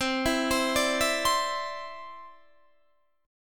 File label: C Major Add 9th, Guitar DADGAD